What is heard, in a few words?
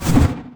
attack; dota; silencer